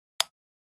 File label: Button Hollow Comput